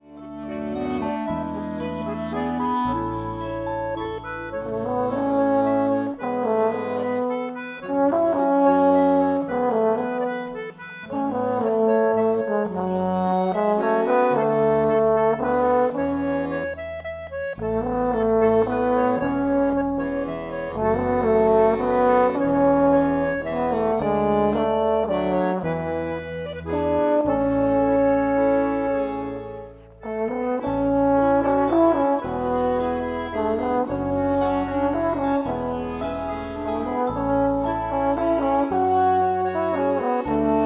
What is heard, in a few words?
Mazurka